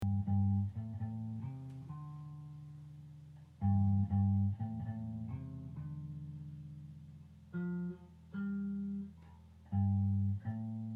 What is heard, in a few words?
bass magic